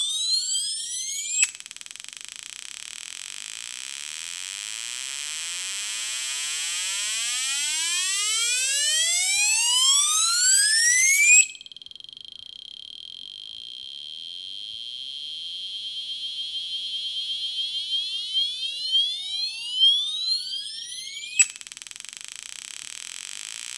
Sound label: piezos sweeps cup